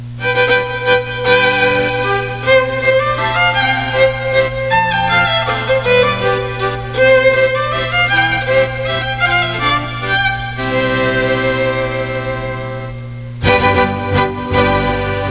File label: waltz